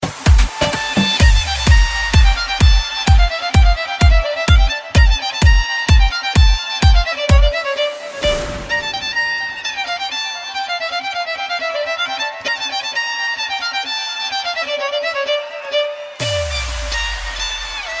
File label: Ibiza Music